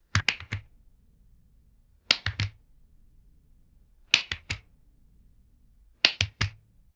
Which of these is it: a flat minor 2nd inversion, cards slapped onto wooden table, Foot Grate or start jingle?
cards slapped onto wooden table